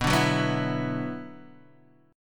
E 7th Suspended 2nd, B, chord

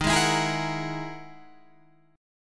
E Major Sharp 11th D sharp

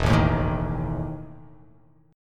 E Major 7th Suspended 4th

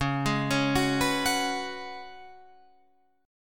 C sharp 7th Suspended 4th C sharp, Guitar Open F; C#7sus4; arpeggiated; tuning=Open F; guitar chord

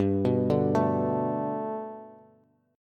G Major Add 9th Bass, chord; Gadd9 arpeggiated; JGuitar

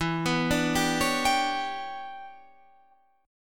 E Minor 13th guitar chord, Half Step Down

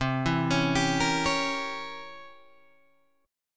C 7th Flat 9th, Guitar, Open G

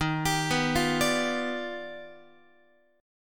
D sharp Augmented Major 9th D sharp Guitar; arpeggiated; x,11,10,9,9,13; JGuitar

